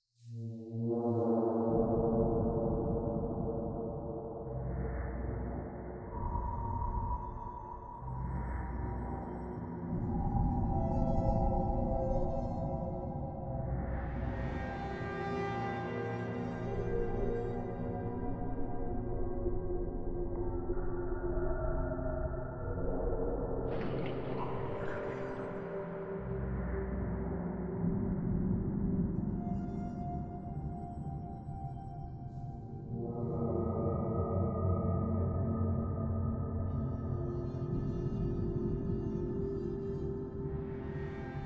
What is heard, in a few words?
underwater; jai faim